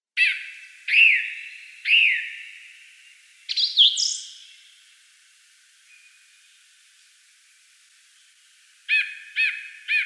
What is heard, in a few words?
Turdus philomelos